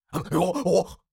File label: Cartoon lion voice sigh surprise